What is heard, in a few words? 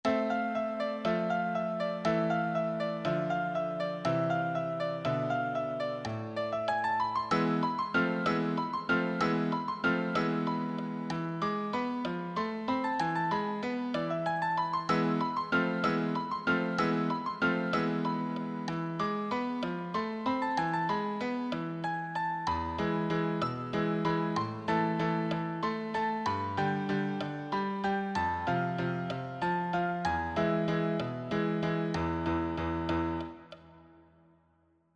solo, piano